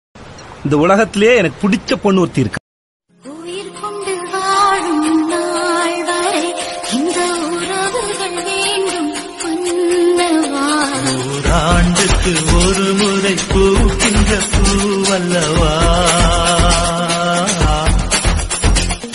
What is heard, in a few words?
tamil song